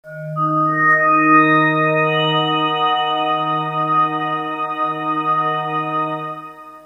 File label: bowl